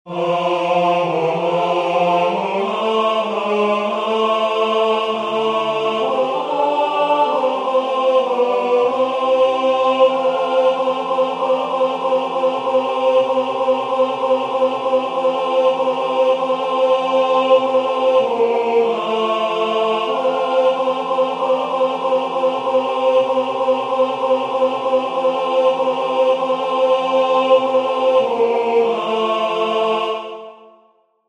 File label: tenor; Shepherds Came to Bethlehem